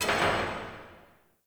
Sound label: Under City Distant Metal Clang Ring